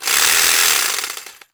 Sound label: Jig Saw; 1001 Sound Effects